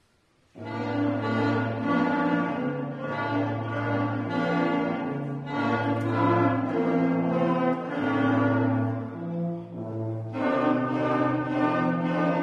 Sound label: jingle bells; Konzertmusikbewertung 2024; Adventkonzert 2023; Bläserklasse Angern; ortsmusik ollersdorf